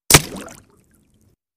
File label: Bullet Impacts Water